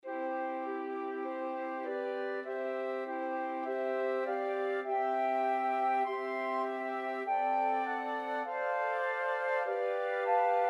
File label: flute quartet